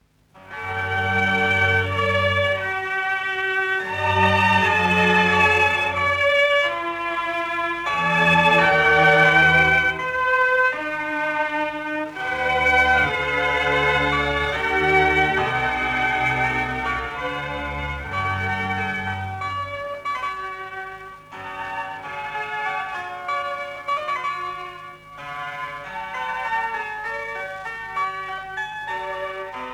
Concerto in A major for orchestra - II. Larghetto; J.S Bach; L'oiseau - lyre

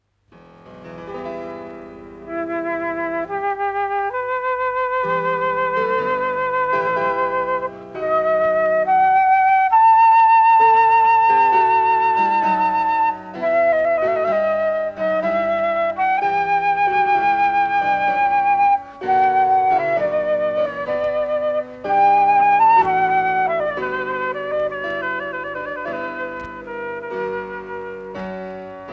Creamsonata H minor - IV. Allegro

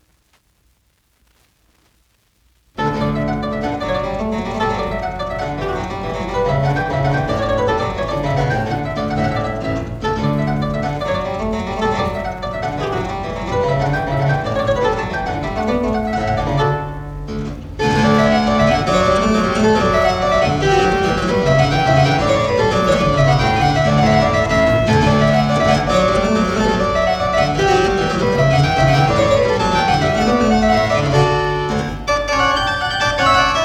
Harpsichord Suite; 14th Suite (La Linote efarouchee); Francois Couperin

